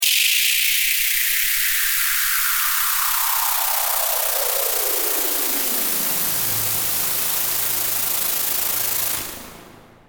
Vapouriser, vaporising effects; 5 x vaporising effects; Battle; Vaporizer

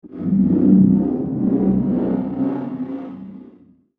Science Fiction Black Hole

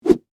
Whip Whoosh Large, Dowel, Rod; Foley; Whips (Sound Effects:Objects); BlastWave

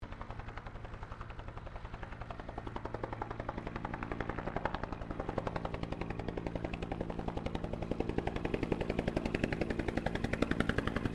HELICOPTER 1967 BELL UH1 IROQUIOS HOVER DISTANT; RAN UH-1 Iroguios; Helicopters (Sound Effects:Vehicles)

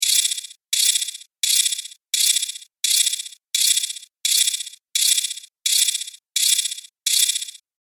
Sharp Electric Metal Loop, metal music

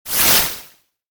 The Magic Cape, magic sound; Magic Sound Effects; fantasy sound effect; Andrew Fleming

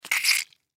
Squish Juicy Squeeze; Squishes; Foley; Blastwave

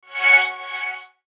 Logo Musical Pulses; Sonic Logo Sound Effects; Production Element Imaging Element Accent Transition

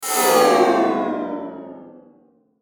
Metallic Descend Scrape; Metallic Sound; Metallic Sound Sound Effects; Production Element Imaging Element Accent Transition

